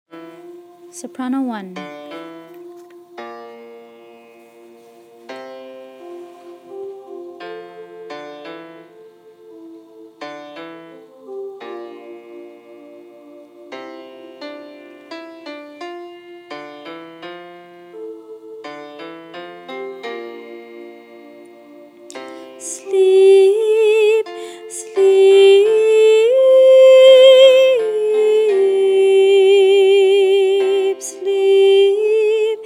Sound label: silent night soprano 2